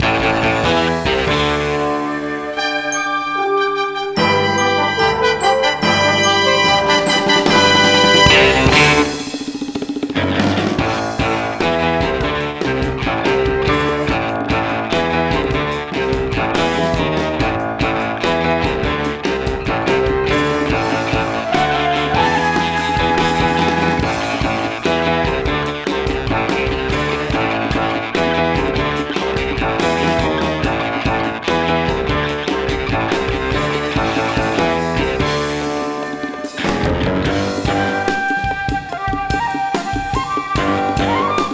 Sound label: match end blue win music